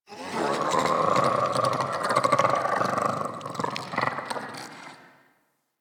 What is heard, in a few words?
Alchemy Lab Create Vocals; Warframe; Hub; Liset Alchemy Lab